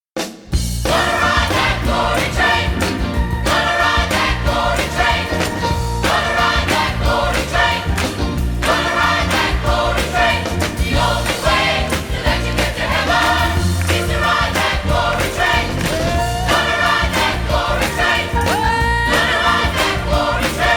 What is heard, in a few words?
I Want to Ride That Glory Train, Gospel Choir